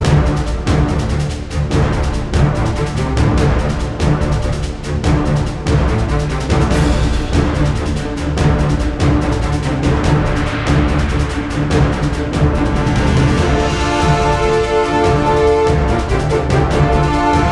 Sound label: Troll Rocket Base; Skylanders; Trap Team